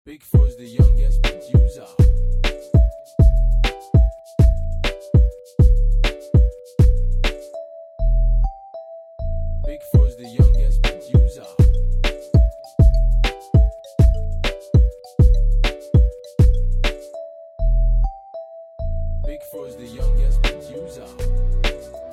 Riddim Instrumental

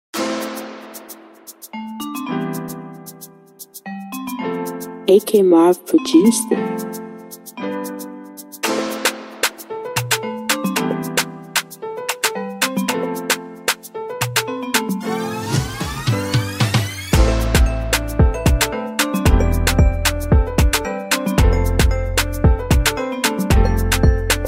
Afro beat Instrumental

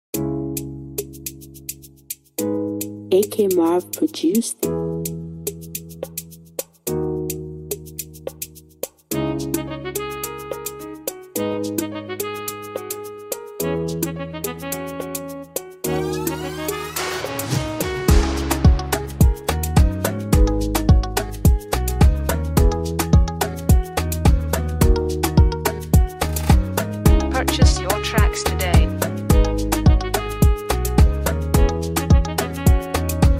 Afro Beat Instrumental

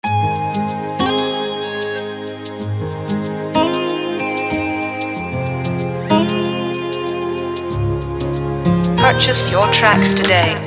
Instrumental

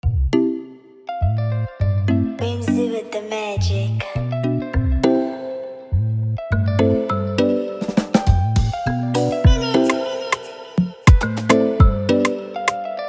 Afrobeat Instrumental

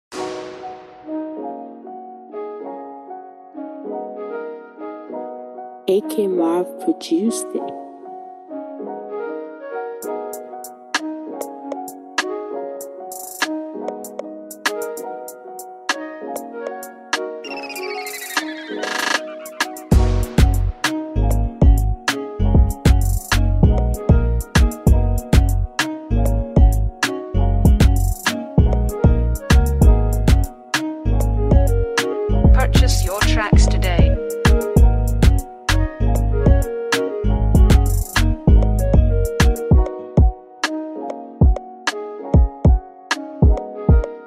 Afro Swing Instrumental

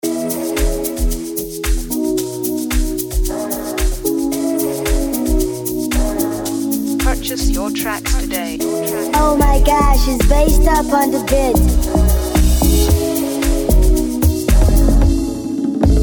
Beat With Hook

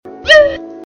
comedy sound effect

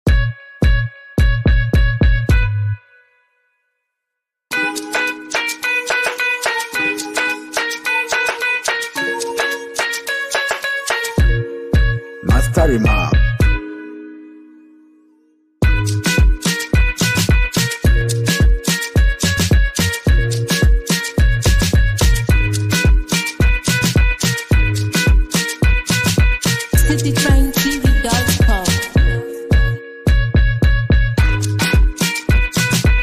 Instrumental

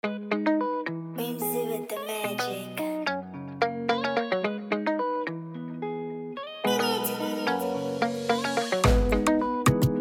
Afrobeat Instrumental

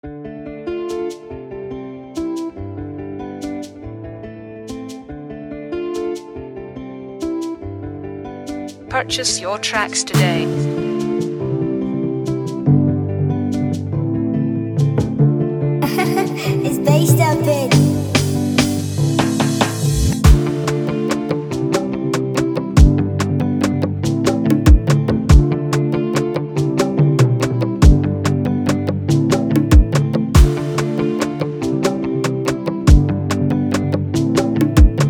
Jazz Me 95C

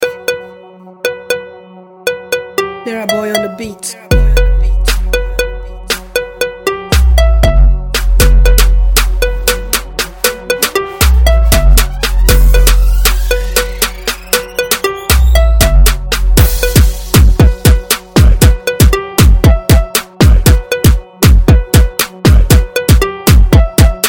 Type Beat

